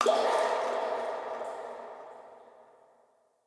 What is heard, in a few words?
Coin Toss Land; Level Events; Midrath